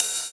Ride; Equipped Music; Loungin House

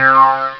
Korg Synth Flange; rare gems

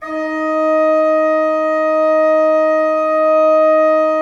16-8 D4, Cathedral Organ; Propeller Island

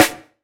snare, Hardcore Breakbeat; Big Fish Audio; Hardcore Breakbeat Action

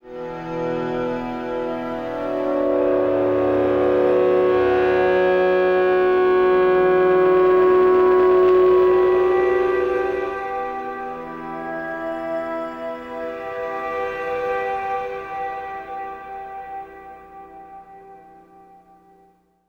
Acoustic Drone, One-Shot Beds; Sonic Foundry (Sony Creative Software) - Friendly Fire; DroneLoop

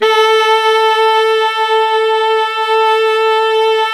saxophone alto, saxophones; Roland - LA Composer vol. 1

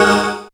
syn g#, Dance Synths Stabs & Hooks; Best Service ProSamples vol.37

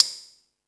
Percussion FX, E-MU Pro-Cussion

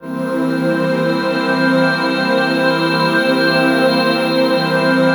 PAD, Drum & Bass, 135 BPM; Ueberschall; Drum & Bass Resonance

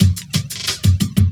LOOP -L, Drum & Bass, 180 BPM

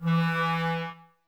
clarinets, Wood Winds

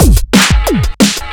Speed loop, Drums Beats

